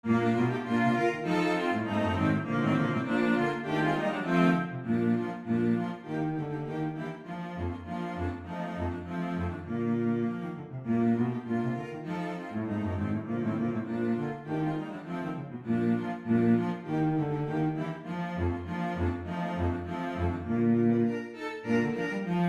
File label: 4 Celli Bb Maj; quartets; Greased Pig Quick Step